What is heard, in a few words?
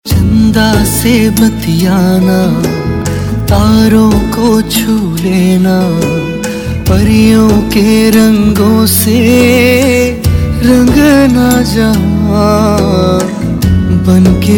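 Ringtone, Bollywood Punjabi Pop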